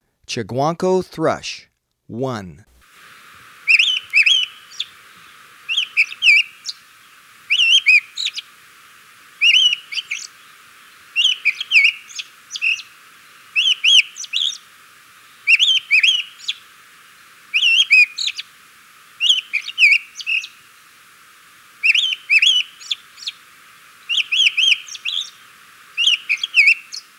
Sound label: Chiguanco Thrush, Birds of Ecuador; S. America